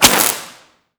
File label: laser beam